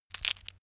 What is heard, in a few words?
stone gravel clatter short; natur-tiere; steine